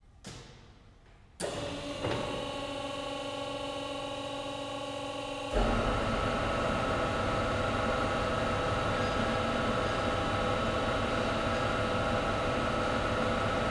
Industrial hall automated band saw on operation off far field; ambiance